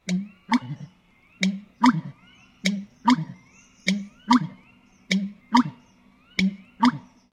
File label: american bittern song